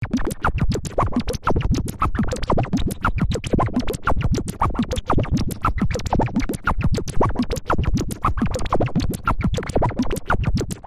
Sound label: tentacle